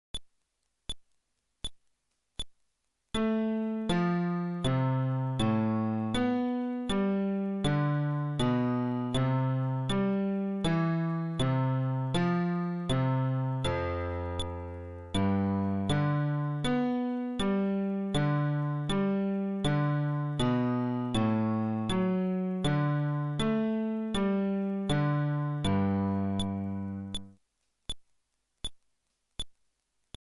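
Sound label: Arpeggio テンポ80, フレットレス bass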